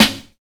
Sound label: short steel snare drum sound c sharp key